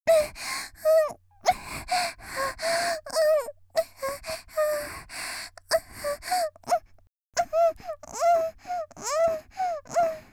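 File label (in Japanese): （少女）「喘ぎ」声を潜める, ASMR; 元気な少女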